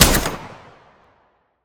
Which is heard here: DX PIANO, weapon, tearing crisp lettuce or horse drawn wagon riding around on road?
weapon